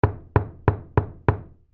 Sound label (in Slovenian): TRKANJE; Efekti